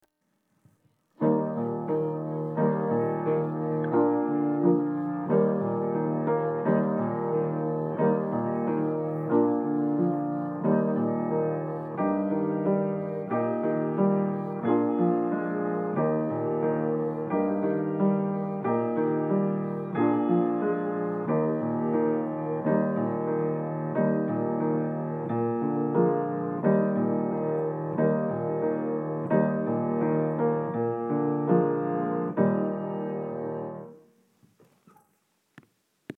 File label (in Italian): base musicale